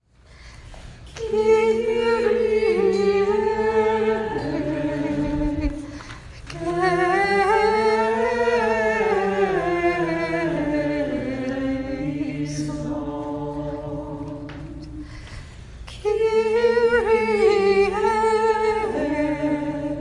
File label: Kyrie melody only